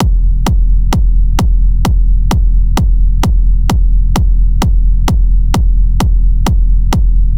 Reverb Techno Kick

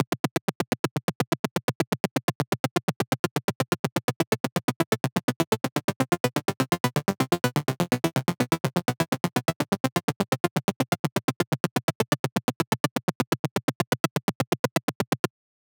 insane arp

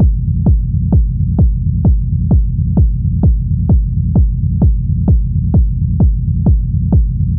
techno kick with reverb

